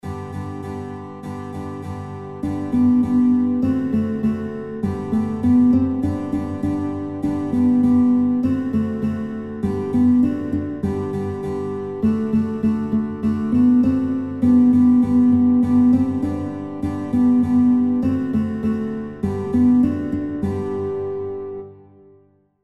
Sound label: Guitar, kinderlieder gitarre playalongs; Guitar Songbook; Haenschen Klein